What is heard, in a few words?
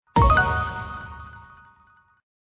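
wild drop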